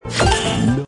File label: win; Brazil; slottiger